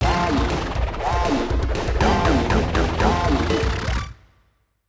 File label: Jingle, Boss Stage; WarioWare: Smooth Moves